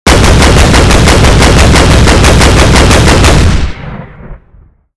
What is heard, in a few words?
zpu fire, weapons; ModernWarfare